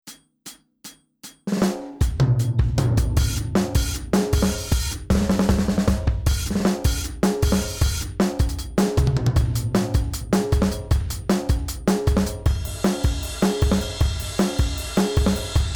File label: Dub Remix Kit